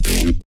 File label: F Bass Hit